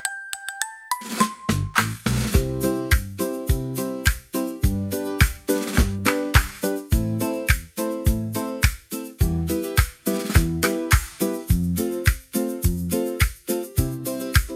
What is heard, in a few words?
instrumental; kociewiaczek